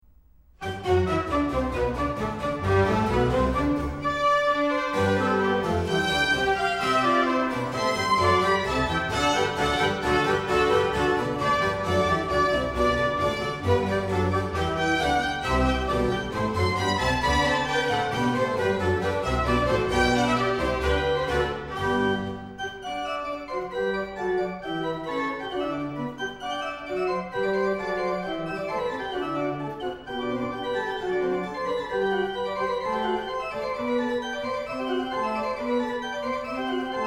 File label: Organ Concerto In G; whitchurch; Handel; Organ Concerto #1